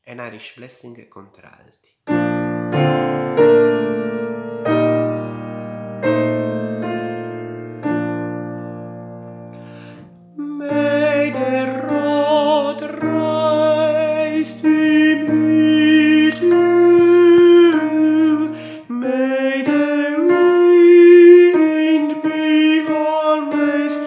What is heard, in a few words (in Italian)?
contralti